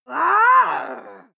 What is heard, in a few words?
female aggressive scream; schreie; menschen